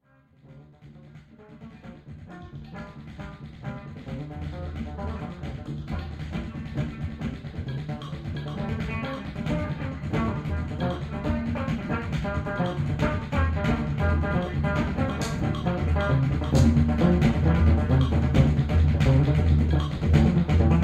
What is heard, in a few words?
tribal